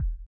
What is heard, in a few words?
SONAR L; Spectrasonics; Metamorphosis